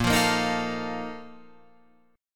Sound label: Bb Major 7th Suspended 4th, Guitar, Open F; BbM7sus4 strummed